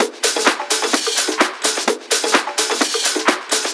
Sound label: Percussive Loops 128BPM; Vengeance Samples